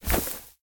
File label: Footstep; Smite; god Kumbhakarna